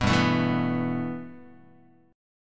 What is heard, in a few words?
G 6th Add 9th G, Guitar; G6add9 strummed; 2,3,0,2,3,x